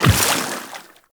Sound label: Run Water, Footsteps; Warframe; Tenno